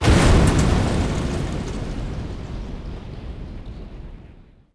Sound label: explo soft debris; impacts explos